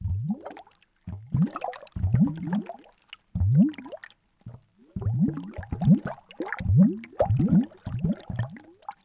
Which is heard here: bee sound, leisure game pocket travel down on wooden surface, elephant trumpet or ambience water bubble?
ambience water bubble